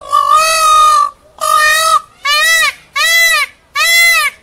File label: peacock